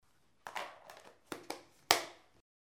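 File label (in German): Tupperdose schließen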